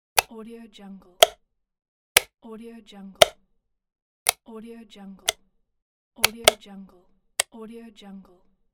the light switch

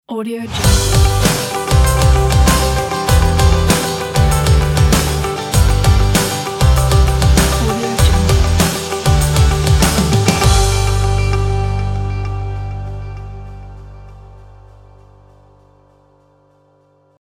summer logo; powstudio

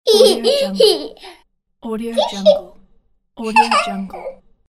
girl laugh

